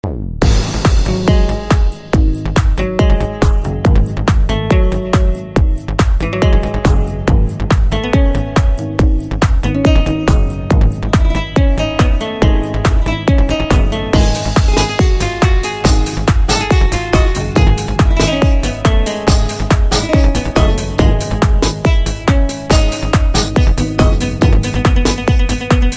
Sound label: Arab Tribal Mix